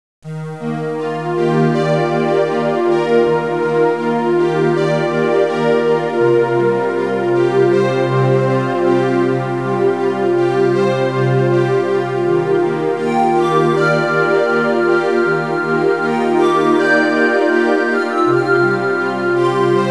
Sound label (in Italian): audio suonerie musica mistica